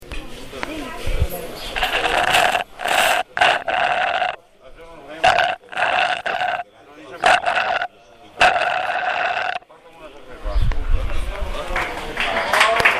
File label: straw gurgles